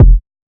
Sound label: Kick